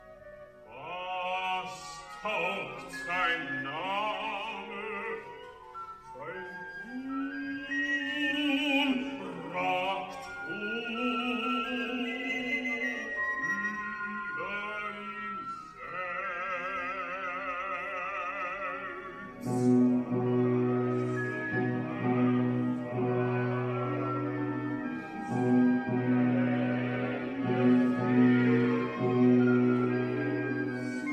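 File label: basses; Cardillac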